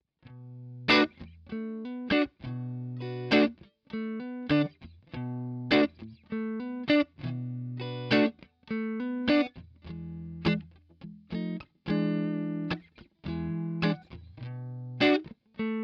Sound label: Jamsession